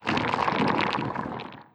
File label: Earth Quag crawl; Legend of Keepers